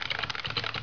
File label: keyboard